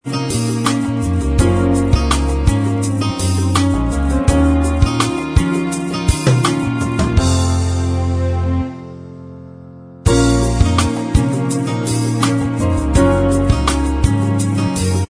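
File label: instrumental track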